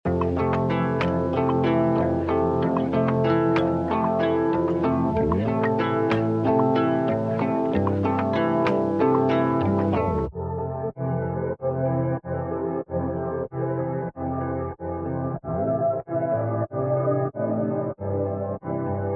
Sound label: 94bpm C major